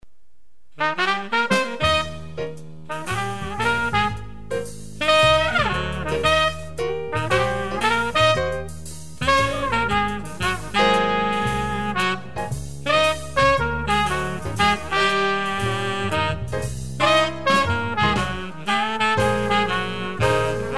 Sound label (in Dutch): trompet dixie